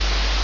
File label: ambience room tone